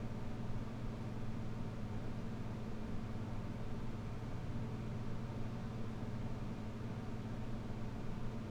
amb loop employee lounge

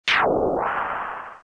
kill; final fantasy vii

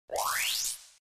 battery charge

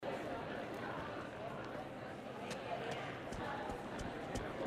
metro station sound; metro 2033

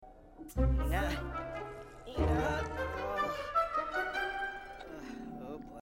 pee themself; sims 4

